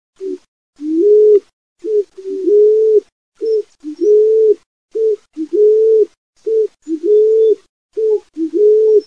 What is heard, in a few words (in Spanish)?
GUACOA